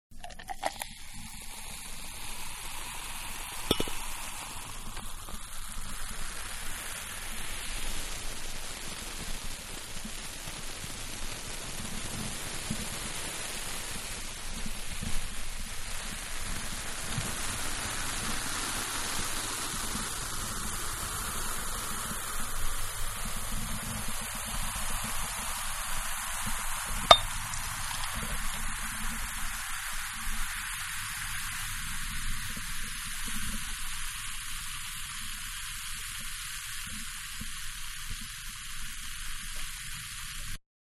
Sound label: Champagne Pour; Champagne-Pouring; Champagne (Sound Effects:Bars); Manhattan Production Music